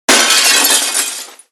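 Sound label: sound of broken glass sms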